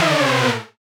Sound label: Warped FX - A; House; Various FX